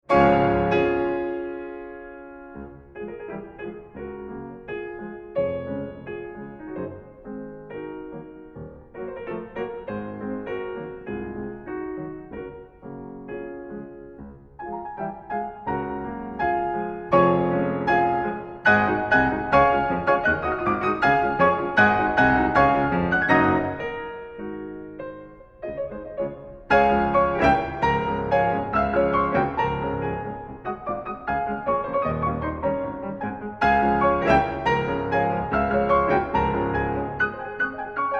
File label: Vivace Hungarian Dances for Piano Duet; Brahms; No. 6 in D flat; 21 Hungarian Dances WoO 1